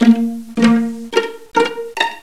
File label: ENSONIQ PIZZ STRINGS